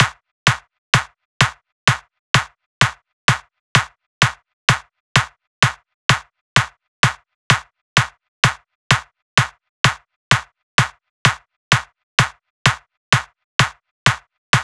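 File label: Drum Loop 128BPM bass drum; VEC5; Drumloops; Multi Loops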